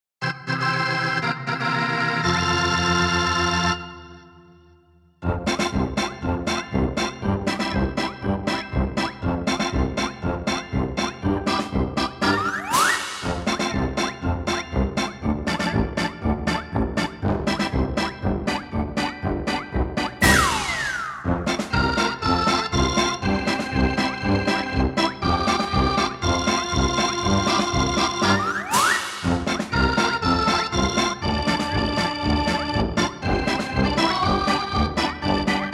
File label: Pink Room Minigame; Evil Nun The Broken Mask